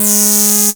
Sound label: CREEPY G#2, sequencer; Best Service Alpha Dance vol.2